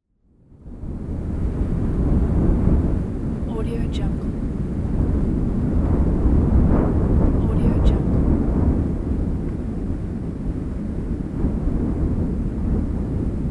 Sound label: wind; volkovsound